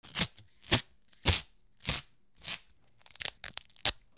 RIPPING

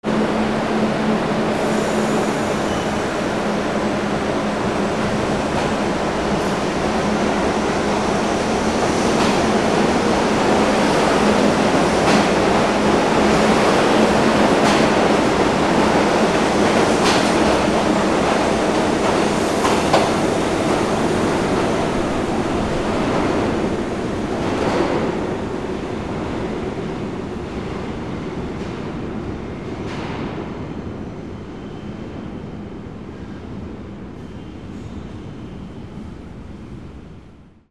AMBIENCE

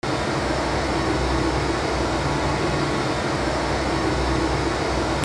SCIFI

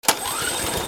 GARAGE

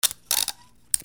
HOUSE

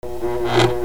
INDUSTRY

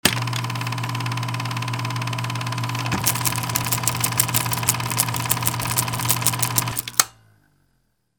CAMERAS

